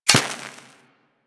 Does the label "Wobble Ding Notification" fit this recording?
No